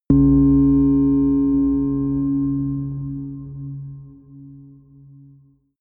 melodic odd harmonic bell low

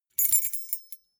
key ring small rattle air

